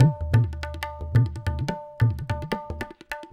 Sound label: Tabla; Percussion; Sonic Foundry (Sony Creative Software); World Pop